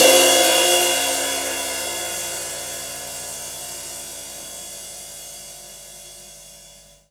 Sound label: CYMBAL, CRASH; Sonic Foundry (Sony Creative Software); Loops & Samples Drum Tools; Laid Back